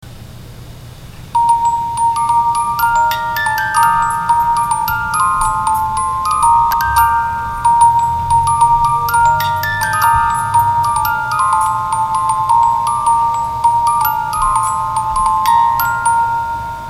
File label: 18 Note